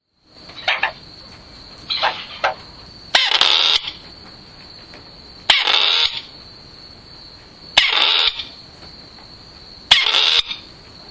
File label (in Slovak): jarabica obycajna